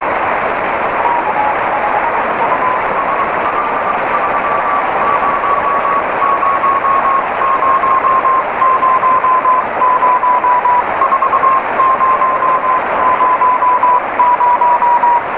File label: cw